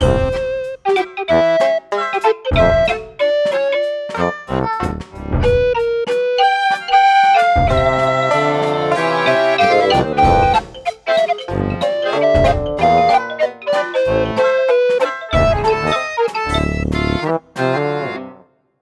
Beats; Sparklite

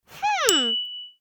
Caramel Click Sound; Jolly Battle

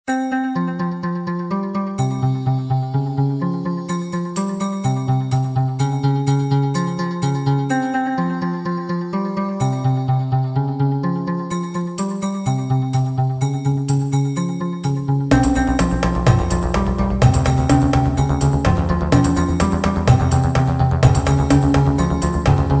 Forest

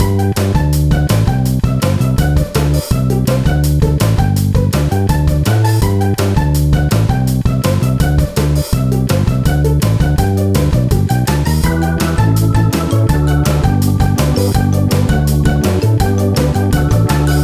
red green music

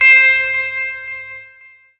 Balloon Floating; murasaki baby; ps vita gamerip 2014

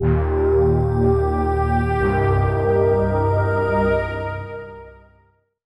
Basic Mood Lab

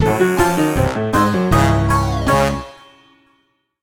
Jingle Level Bonus Timebased Lose

